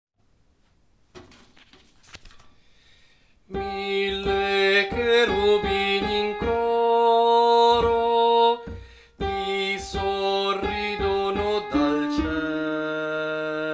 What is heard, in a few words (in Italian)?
soprani e tenore, coro; Natale; Mille cherubini in coro; Coro Isola del Giglio